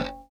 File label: gb2 release, glowlybass